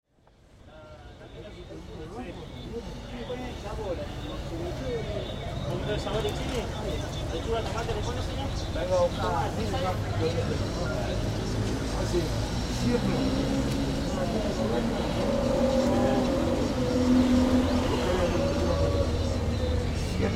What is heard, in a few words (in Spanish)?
bares carrito; av uriburu 1700; Rosario